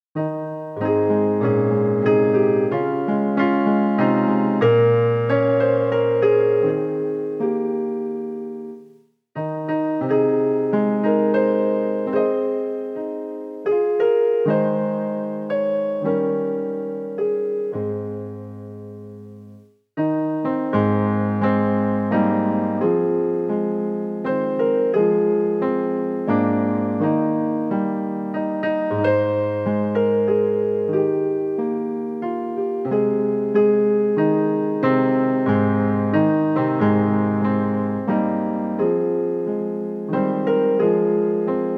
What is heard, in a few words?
Star Spangled Banner (Instrumental)